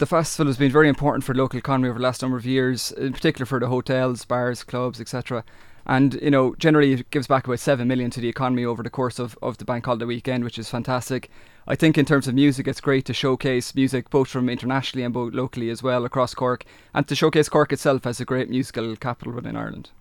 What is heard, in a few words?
jazz; cork; jazz weekend